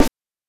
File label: GHOST SD; NES DPCM; MIDINES